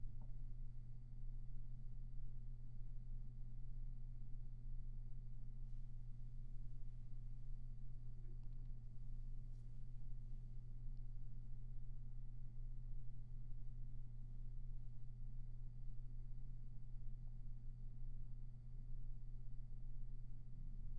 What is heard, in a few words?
Roomtone